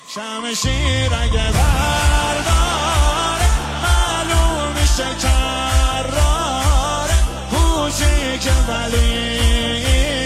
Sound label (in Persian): مداحی